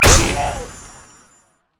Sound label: poro explosion